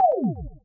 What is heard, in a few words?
pew